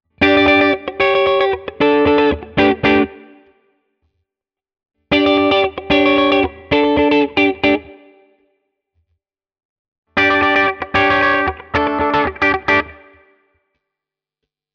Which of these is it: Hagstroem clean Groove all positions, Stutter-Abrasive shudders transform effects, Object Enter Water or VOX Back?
Hagstroem clean Groove all positions